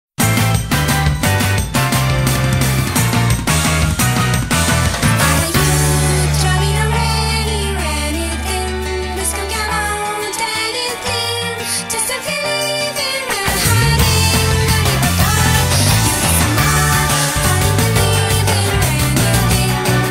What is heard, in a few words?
guitar pop; Z-I-v Summer Contest 2023